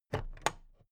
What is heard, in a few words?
plastic clasp open heavy